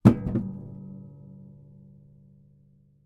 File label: musical guitar acoustic drop on floor